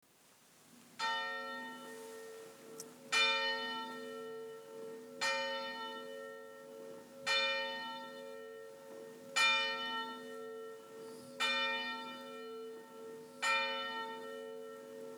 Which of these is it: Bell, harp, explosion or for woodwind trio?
Bell